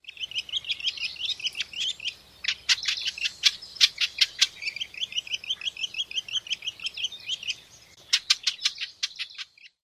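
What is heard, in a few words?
twitter song and chits, bird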